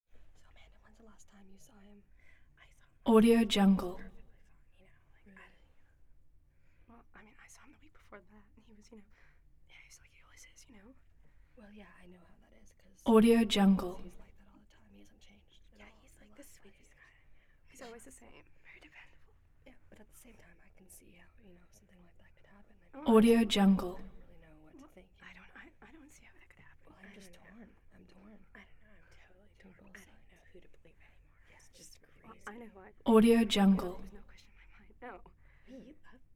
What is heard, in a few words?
whisper crowd